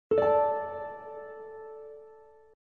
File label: harp sting; The Witcher Hunt